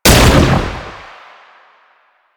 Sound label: Gunbrella Shot, Weapons; Warframe; Tenno; Koumei